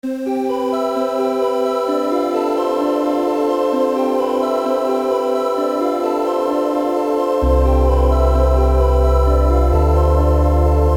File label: beat tape